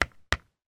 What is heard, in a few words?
toy board game token plastic move on board x2; zapsplat